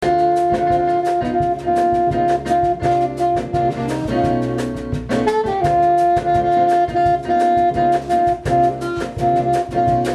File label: samba